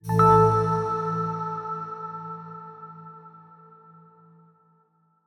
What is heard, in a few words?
Level Clear; Colorgrid